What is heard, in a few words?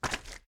Footsteps Swamp; Dwarf